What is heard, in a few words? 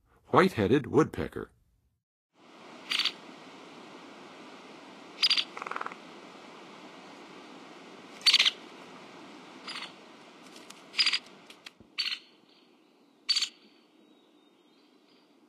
White-headed Woodpecker, Songs of Birds of North America; Western USA; Western USA Songbirds